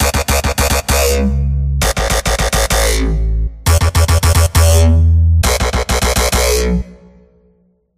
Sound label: growler